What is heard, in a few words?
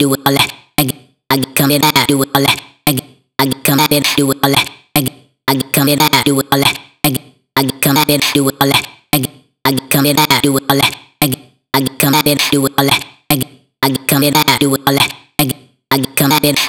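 vocal chop, 115 bpm, G#m; Slate Digital Omnivox Vocal Sample Pack; Chops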